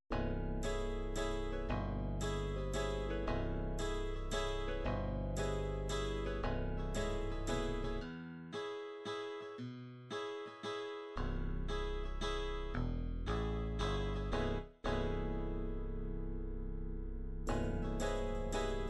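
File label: country waltz, midi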